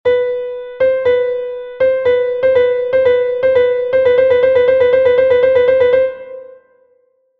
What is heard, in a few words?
ribattuta; riemann 1882